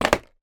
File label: household jewelry box small plastic put down on surface